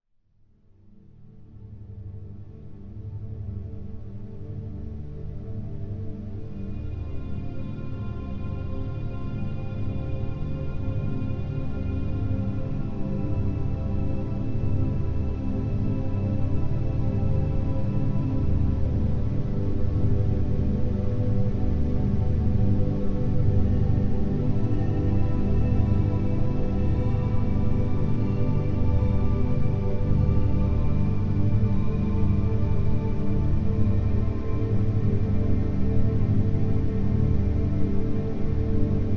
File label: reaction speed binaural